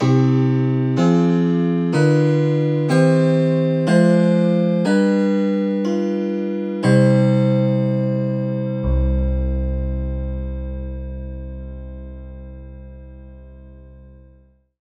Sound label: 10-tet Cadence